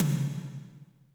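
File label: tom, real mega drums; Best Service; Real Mega Drums VOL-1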